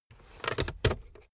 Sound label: car backseat table pull up unsteady